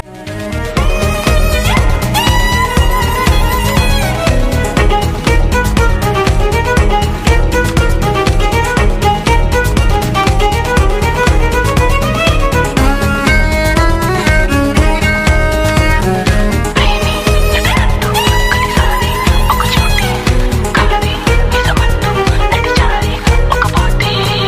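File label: background music, Telugu Ringtone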